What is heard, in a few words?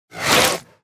Spider Spitter Scream Ending Combined; Deep Rock Galactic Boardgame